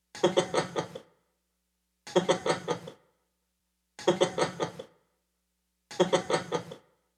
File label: Vox